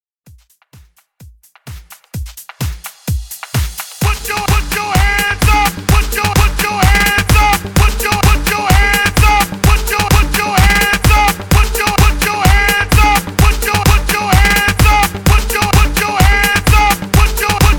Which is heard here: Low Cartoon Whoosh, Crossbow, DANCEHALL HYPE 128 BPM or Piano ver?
DANCEHALL HYPE 128 BPM